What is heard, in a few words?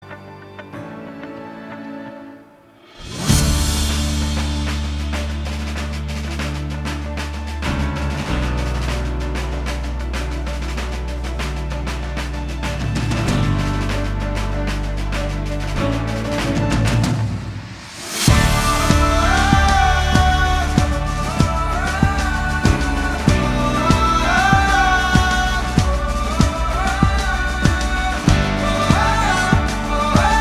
music